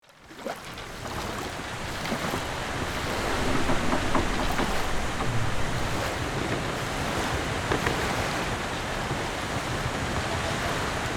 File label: Ship at sea exterior ambience